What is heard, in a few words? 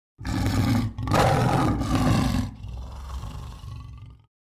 Wild lion growl